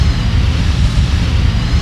in air loop

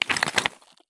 turn tile; Escape from Tarkov

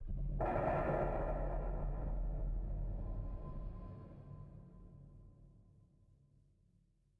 sting metal groan; Rust

